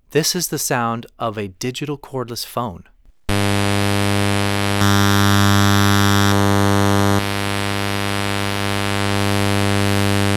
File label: Cordless